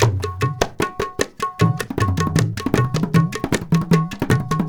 INDIA R, Heart of Asia, IND ENS

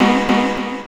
Chords Tones; Equipped Music; Loungin House

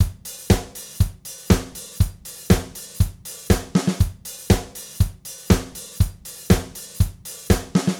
Gretsch groove 120 BPM, 1967 Gretsch Kit drums; Sonic Foundry (Sony Creative Software); Vital Drums

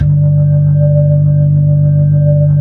B-3, Blues

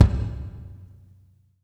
GATED VER, KICK DRUM; Sampleheads; New York City Drumworks VOL-1; SP KICK DRUM

